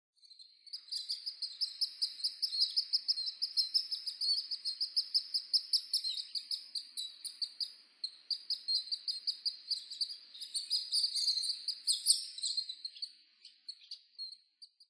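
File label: House Swift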